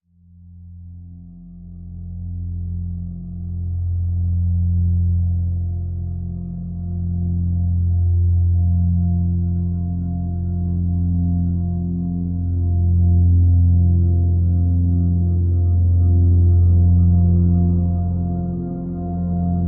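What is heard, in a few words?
drone F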